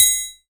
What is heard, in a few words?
ART TRI, ETHNIC PER; AKAI S-Series CD-ROM Sound Library VOL-5